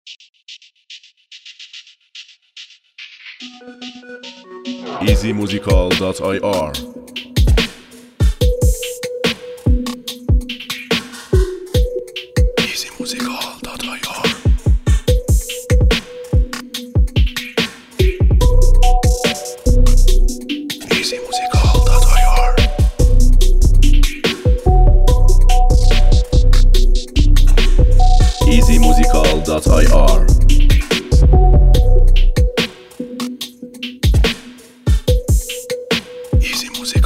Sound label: Beat